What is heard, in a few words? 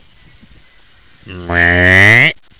MMM; DOOM